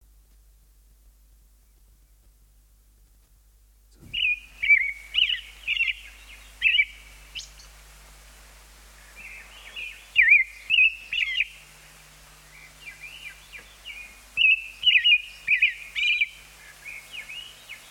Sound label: robin, songbirds